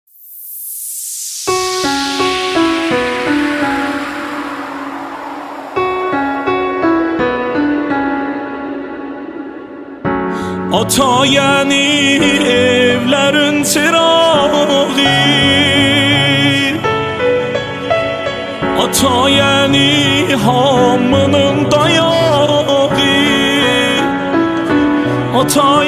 music torki azari

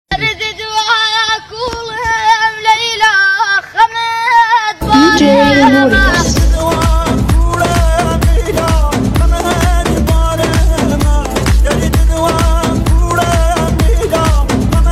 remix kordi ghamgin remix

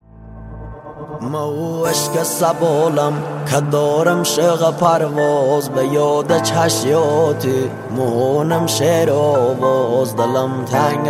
sad music remix

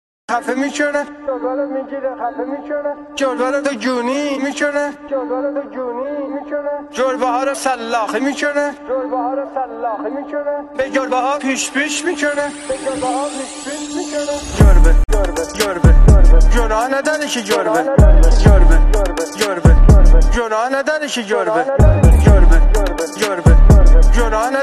new music tanze khandedar

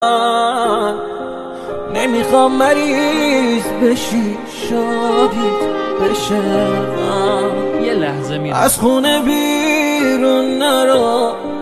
new music tanze khandedar

